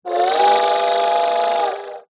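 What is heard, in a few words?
Train Whistle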